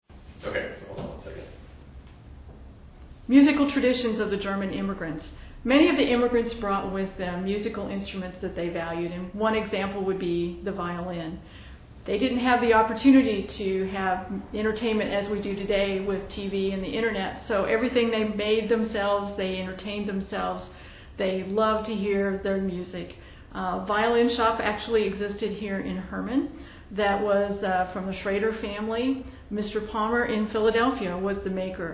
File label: violin; Violin, Deutschheim historic site; Artifacts of Immigration